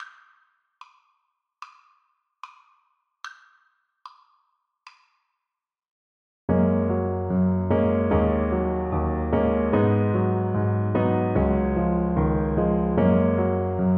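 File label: the singing bird alto sax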